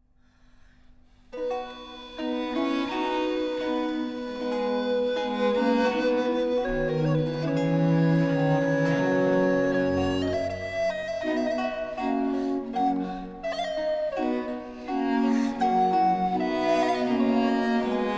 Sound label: C Major Adagio; Corelli; Op. 5 Nr. 3